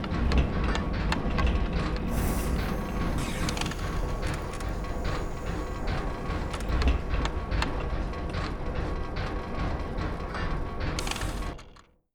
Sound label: Maproom Floor Doors